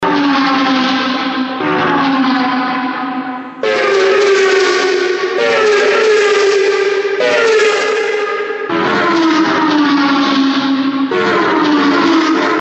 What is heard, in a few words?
car